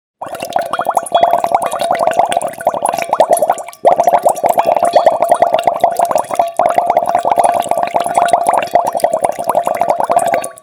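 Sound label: sound of bubbling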